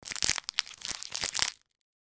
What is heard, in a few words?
food cereal bar wrapper open